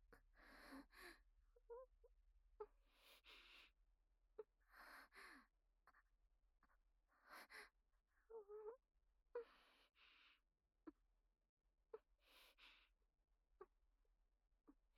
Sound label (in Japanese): 喘ぎ、左右耳舐め, ASMR; アドリブ集